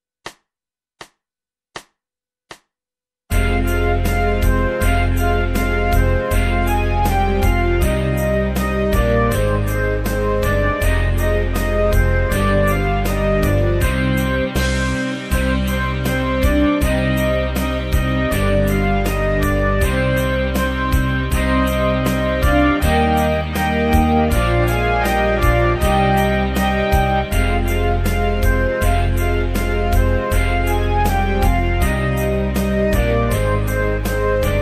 Schulband Volkslied aus Lettland; Musizierklassen; Auga auga ruzena; horn mit erweiterung

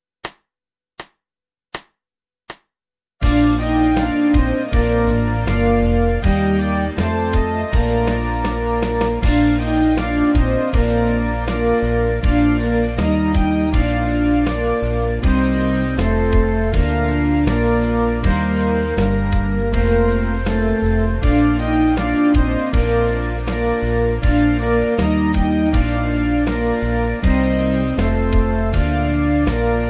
Schulband Musizierklassen Skylark Englisches Volkslied

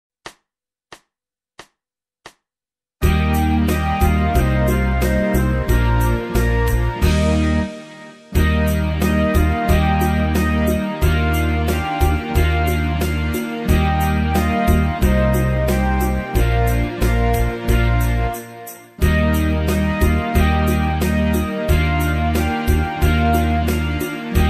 Regen Tatarisches Volkslied; Schulband Musizierklassen; oboe

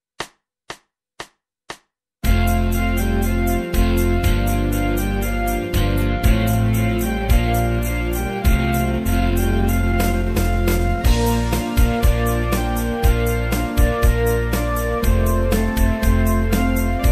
Lustige Welpen Volkslied aus Finnland; Schulband Musizierklassen; querfloete

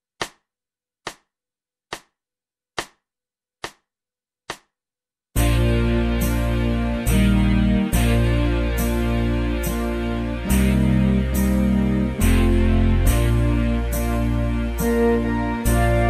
Ninna Nanna Ninna Oh Italienisches Wiegenlied, Querfloete; Schulband Musizierklassen

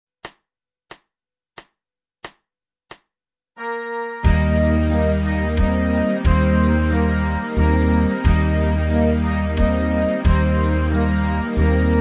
Cowboy Song aus Amerika; Schulband Musizierklassen; partitur

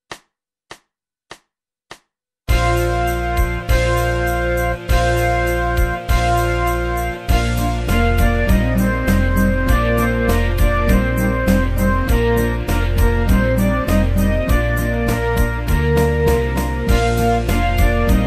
Ten Little Chickens Kinderlied aus Amerika; Schulband Musizierklassen; gitarre 2 powerchords

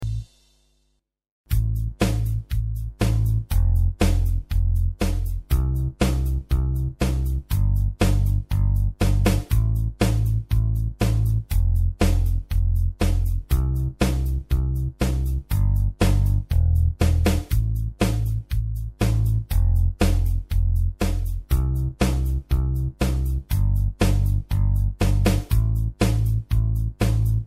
Rockband; 5. Klasse; keyboard piano

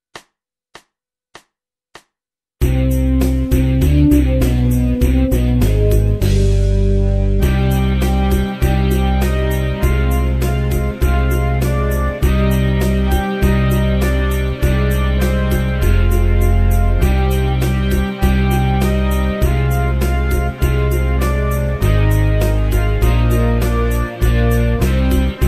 Schneesturm Bulgarisches Volkslied, bratsche; Schulband Musizierklassen

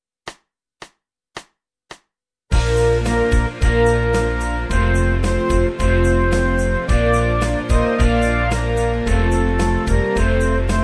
Sur le pont d Avignon Französisches Volkslied, es sax; Schulband Musizierklassen

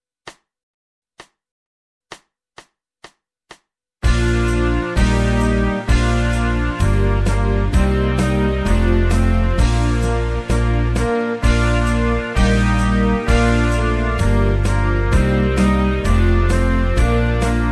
Les poupées Französisches Kinderlied, tuba solo; Schulband Musizierklassen

